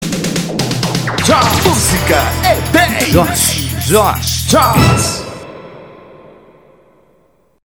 música vinheta

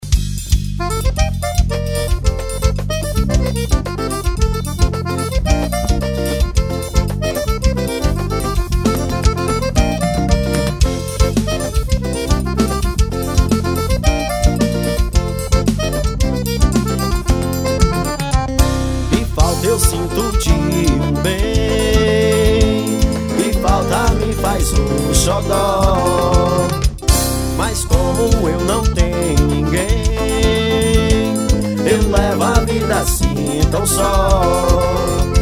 acordeon

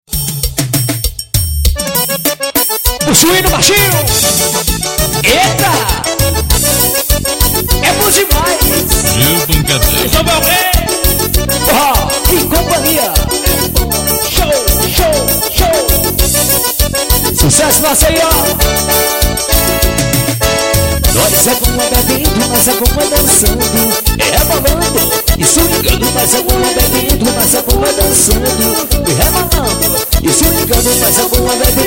forró

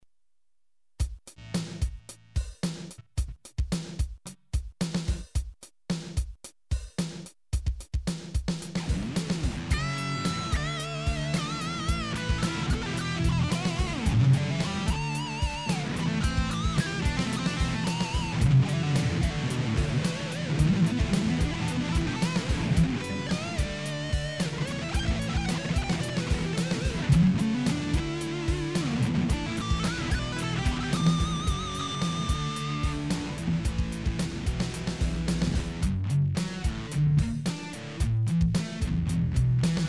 solo protesto

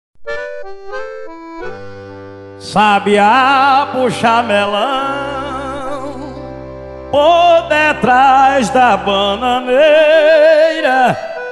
viola

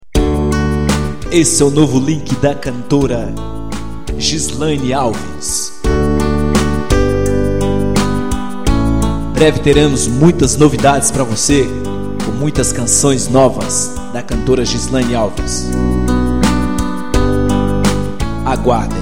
vinheta

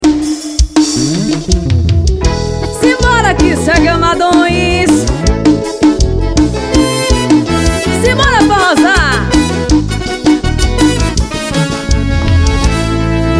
forro ao vivo; caipiras show bar cuite pb

